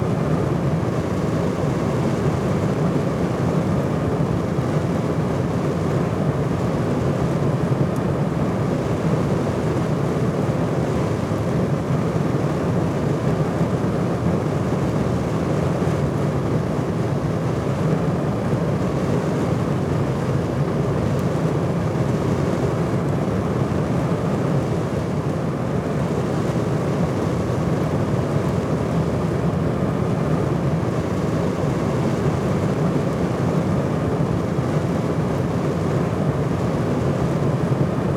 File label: furnace loop; Rust